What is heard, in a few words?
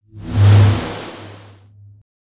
Flyby Clean